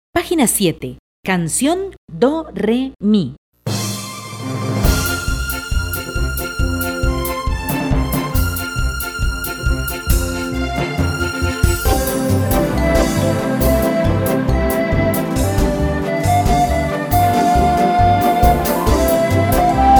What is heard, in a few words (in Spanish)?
do re mi con flauta